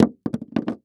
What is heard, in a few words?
Impact Brick Small Piece Fall On Wood